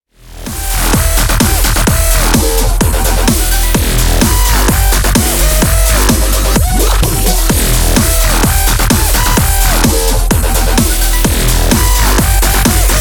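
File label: background music crossfade; Invaxion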